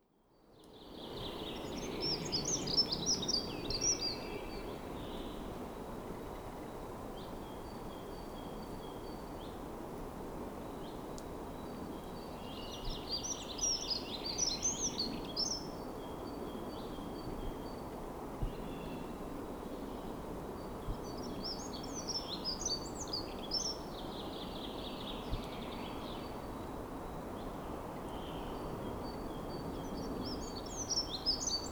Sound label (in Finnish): rautiainen, linnut; laulu; taustalla peippo